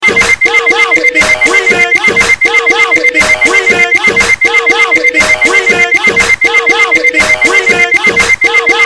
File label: hip hop alarm, ringtone